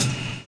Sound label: live kick; Univers Sons - Kitch 70s